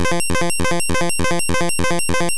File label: Solar Beam Charge; sfx; attack moves; pokemon; gen 2; gsc